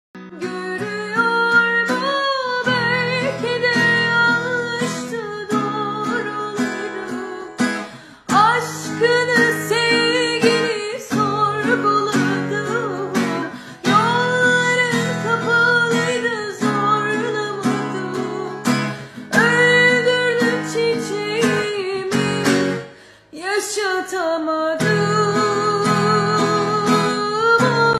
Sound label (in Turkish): akustik